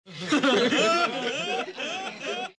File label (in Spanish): risa nerds